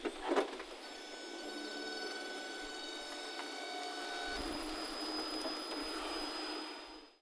prius reverse uphill

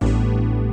out of sync

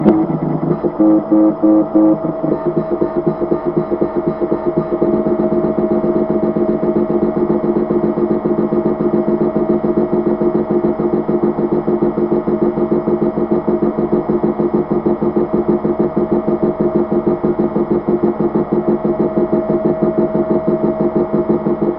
maybe printer

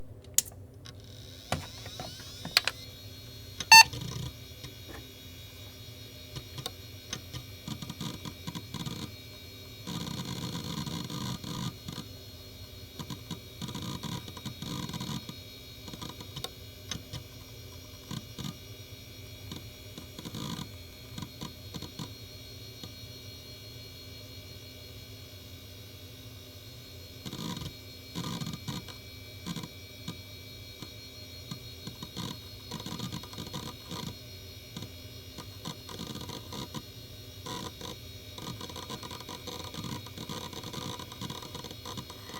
speaker

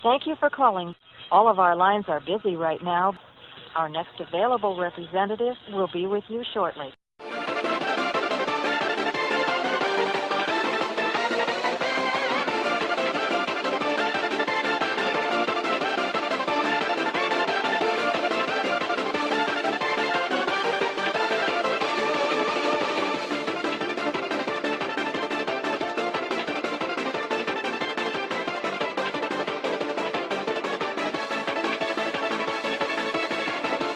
bad hold music

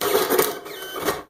printer print receipt

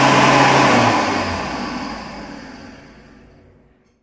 hand dryer stop

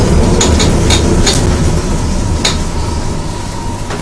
elevator stop

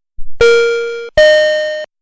low chime high chime

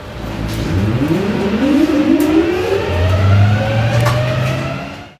train start; digistuff nbextras

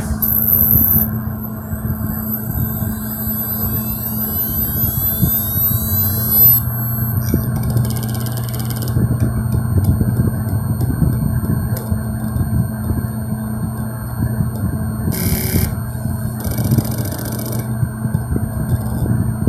hc650 spin up

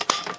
firealarm pullstation pull

